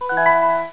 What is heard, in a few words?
Menu Select